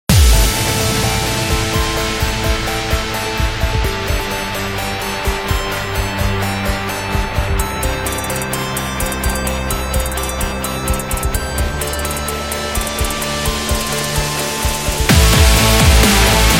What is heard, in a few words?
Powerful Dubstep